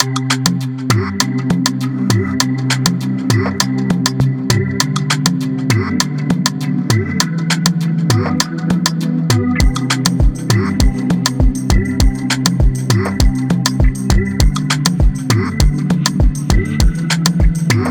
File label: Instrumental